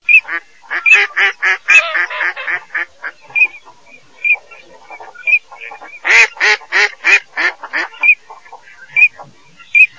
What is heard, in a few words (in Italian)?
GERMANO ALZAVOLA